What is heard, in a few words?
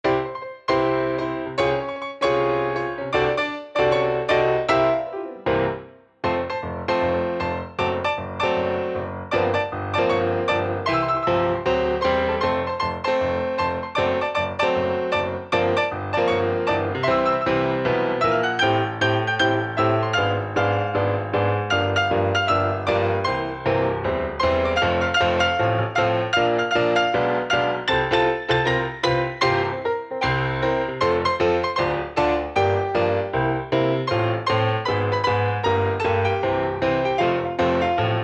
Piano; baron fur is gonna fly